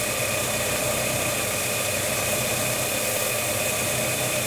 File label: engine low; War Thunder